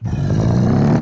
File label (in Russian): udar